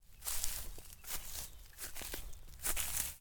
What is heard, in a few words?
walk Grass; butterflysoup2